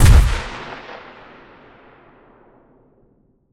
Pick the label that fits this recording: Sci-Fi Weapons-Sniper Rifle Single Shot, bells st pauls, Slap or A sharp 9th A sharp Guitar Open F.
Sci-Fi Weapons-Sniper Rifle Single Shot